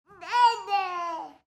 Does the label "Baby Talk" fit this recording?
Yes